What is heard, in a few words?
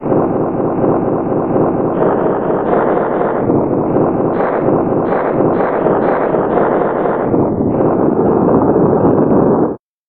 applause bass